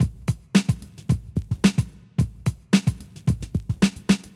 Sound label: Groovey Hip Hop 110